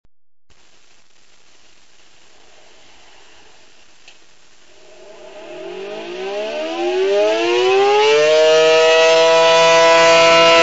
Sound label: Attack, sirens